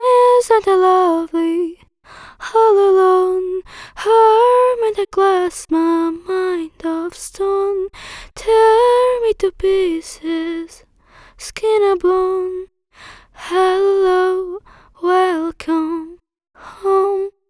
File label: English Singing